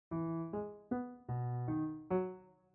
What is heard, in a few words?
tonic dominant arpeggio